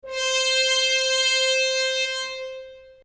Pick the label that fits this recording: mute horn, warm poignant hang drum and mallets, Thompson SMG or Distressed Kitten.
mute horn